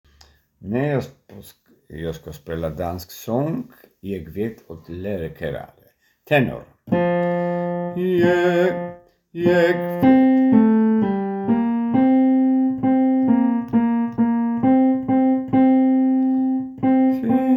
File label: tenor